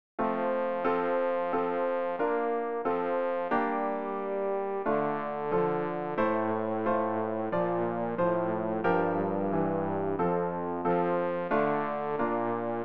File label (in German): bass; Ref. Gesangbuch; RG 597: Herr, der du mir das Leben